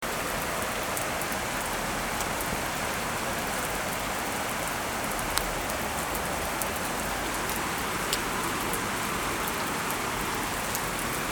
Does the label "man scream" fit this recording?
No